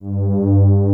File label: ST SA, SYNTHESIZER ANA STRINGS; AKAI S6000 CD-ROM - Volume 1